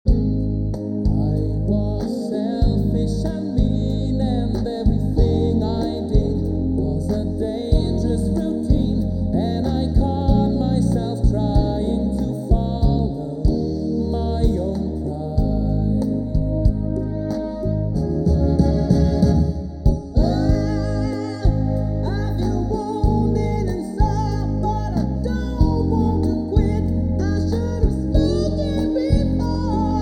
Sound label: Bandpass filtered in the compressed domain; Author: Heiko Purnhagen